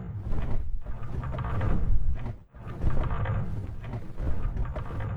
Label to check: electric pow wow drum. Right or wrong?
Wrong